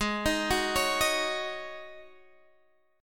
G sharp 7th Suspended 4th G sharp, Guitar Standard; x,11,13,11,14,11; arpeggiated; capo=3